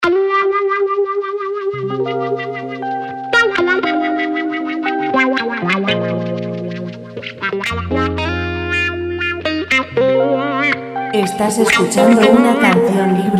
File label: guitar; guitarra; Música para centralitas telefónicas. Volumen 2